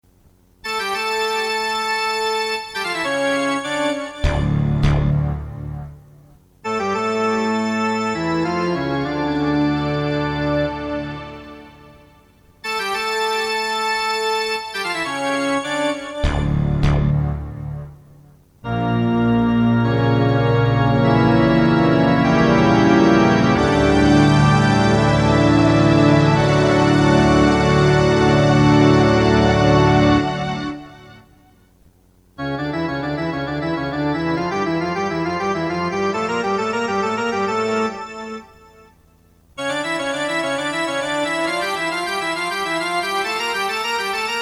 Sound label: Toccata techno